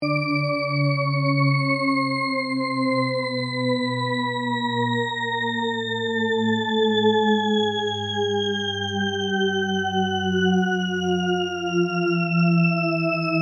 Shepard Tone Down; Music, Synth; Synths; Musical; BlastWave

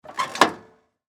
Lawn Mower Clutch, Release; Industry; John, Deer; Lawn Mowers

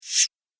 Whoosh Fast Air Cut

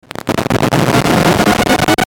Ascend Static Crackle Buzz; Production Element Imaging Element Accent Transition; Ascending

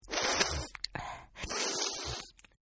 Sniff; Sickness Sounds; Sniffling; Human; Partners In Rhyme

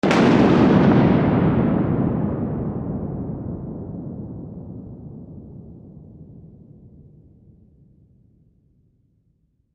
Weather Thunder Clap; Sound Effects:Weather; SFX Bible 6

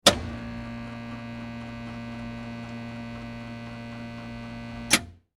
Industry Generator On Run Off Short; Machinery; Machines

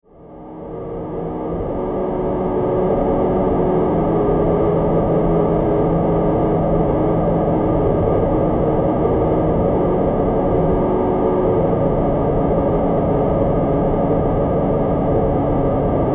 Heavy Metal Industry Drone; Drone Sound Effects; Andrew Fleming

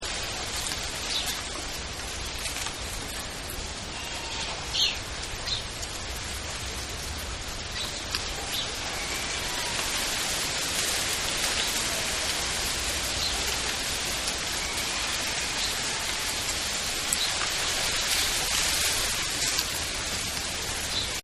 Country Ambience, Nature Scenes; Environmental; Partners In Rhyme

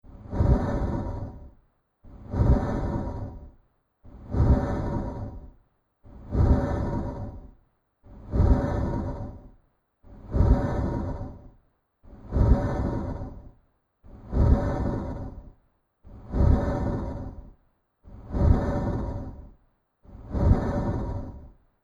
Undead Breathing; Scary Breathing Sound Effects; Horror Sound Effect

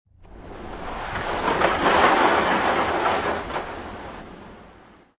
Skiing Snowboarding Snowboard Pass By Center; Weather; Snow (Sound Effects:Weather)

